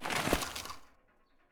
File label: prone metal; Escape from Tarkov